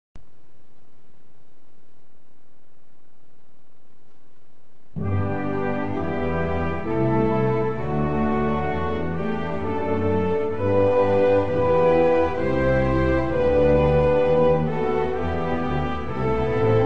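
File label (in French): hymne royaume uni, play back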